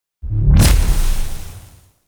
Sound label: Recall Warp; Warframe; Railjack